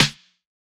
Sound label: Snare, Interesting Drums